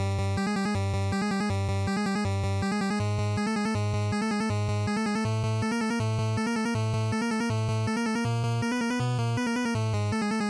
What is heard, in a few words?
NES Shooter Boss Loop160